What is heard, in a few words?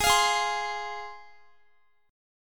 G sharp 6th G sharp down Guitar, chord